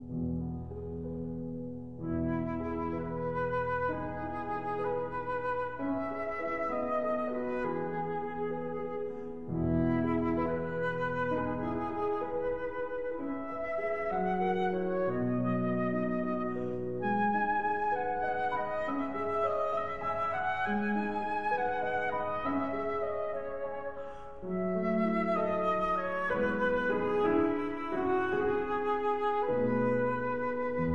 Berceuse (Lullaby) for flute and piano

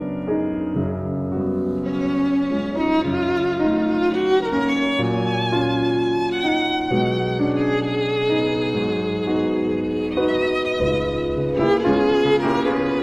for violin and piano; Estrellita (Little Star)

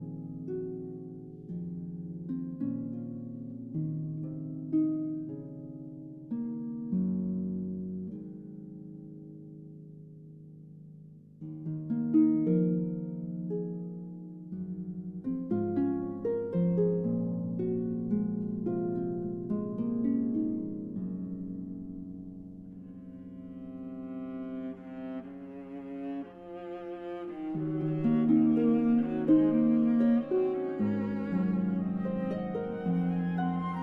Harp Concerto Lullaby

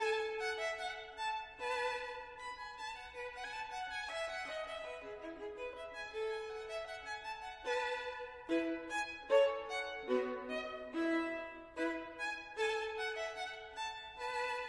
Gavotte en rondeau, Violin; Bach, Johann Sebastian

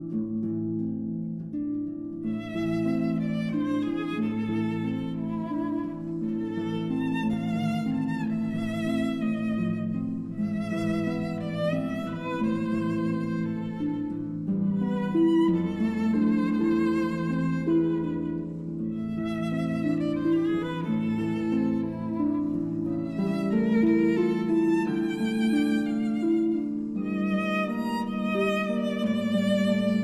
Berceuse, for violin and harp

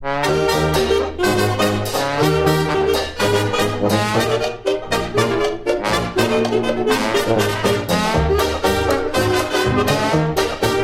Jazz Orchestra

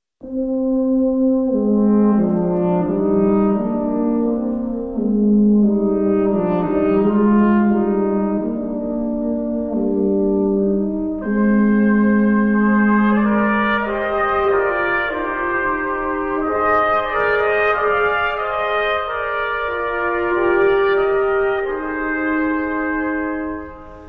Brass; Salvation Is Created; arr. Vernon M. Whaley

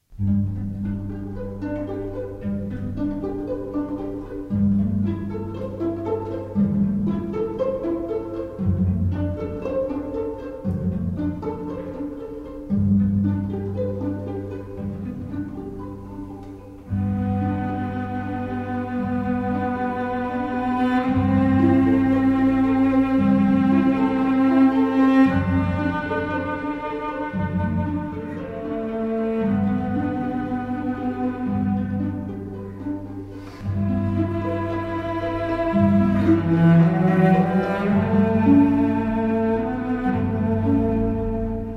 Ave Maria for Cello and Orchestra; Bach, J. S.; arr. by Gounod

